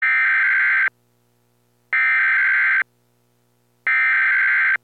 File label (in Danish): alarm om alvorligt vejr